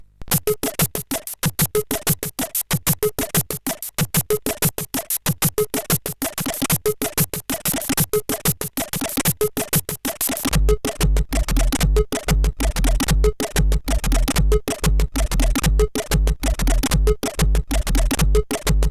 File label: Jungle Beat, sid, CSG8580R5